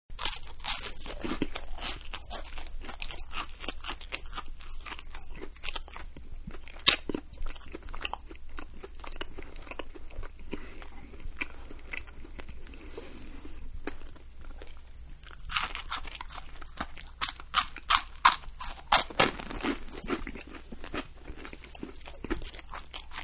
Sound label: Toast Eating; Food; Household; Manhattan Production Music